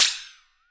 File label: ALUM THING, Living Drums; Sampleheads; Peter Erskine